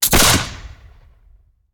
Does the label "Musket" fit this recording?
Yes